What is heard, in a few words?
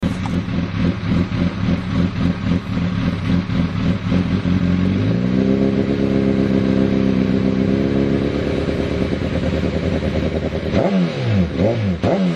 CBR1000RR Slip-On, exhaust sound; honda; TaylorMade